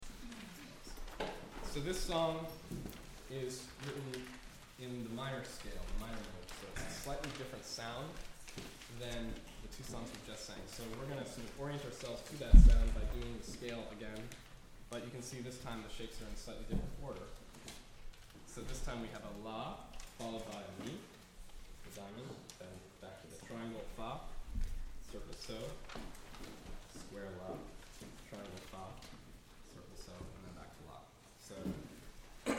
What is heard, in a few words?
singing the minor scale